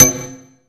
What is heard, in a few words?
HAMMER BL, JV-1080; Syntec; Wall of Sounds